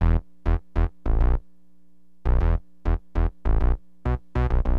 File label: SYN BAS, Acid Jazz Funk; Bass